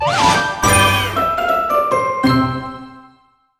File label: Crunchy Finish